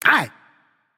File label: ouch; smite; xbalanque